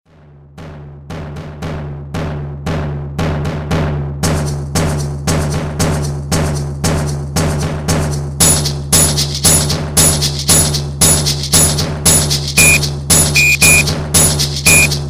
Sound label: Rhythmband